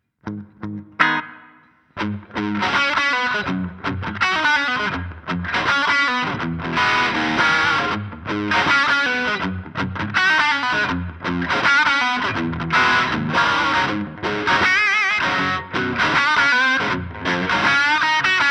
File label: guitar; Cicada → Verb → Amp